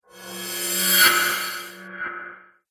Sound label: Reverse Cymbal Whoosh